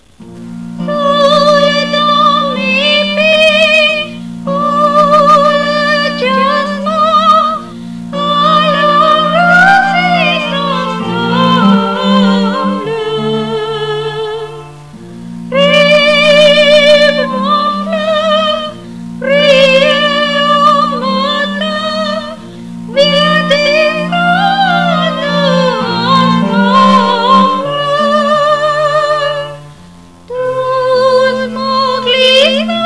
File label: duet